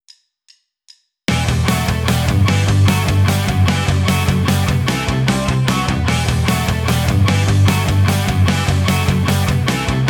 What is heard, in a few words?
Rockschool, Guitar; Guitar Techniques 2018 Issue 289 December